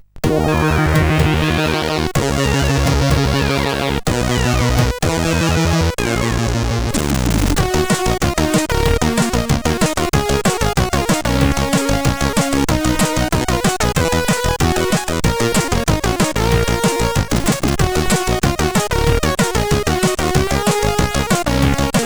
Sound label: Groove, CSG8580R5; sid